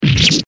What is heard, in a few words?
damage